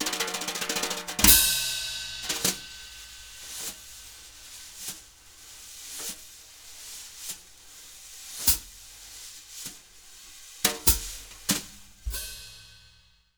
BALLAD, Jazz Latin Drumloops; USB Soundscan vol.08; AKAI

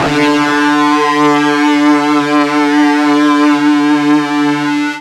FUZZ D 2, ULTRA FUZZ, Guitar; East West; Steve Stevens Guitar Samples Collection

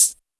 SHAKE, Hip Hop; E-MU Formula 4000 Series Vol. 1; Hip Hop Nation

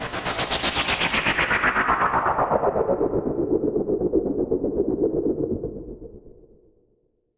Sweep; noise

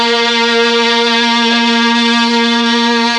Mellotron A#2, STRING; Best Service Gigapack 1

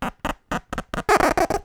Wet finger on book, cartoon Foley Fx; musicradar; cartoon caper

